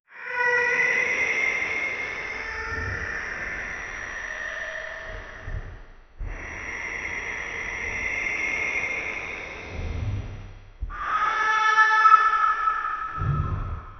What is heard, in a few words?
epic sound of sreaming ca, cave